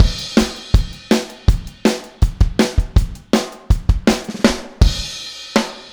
Take Your Toms Off - Chorus - Full Mix, Drums, 162 BPM; Drums from the Big Room The Mixes; Chorus 04